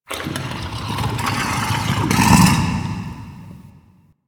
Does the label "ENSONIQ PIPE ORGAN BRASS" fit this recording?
No